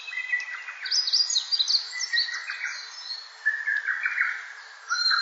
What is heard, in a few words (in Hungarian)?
Fehérzaj; relax compact hang es dallamterapias feher zaj keszulek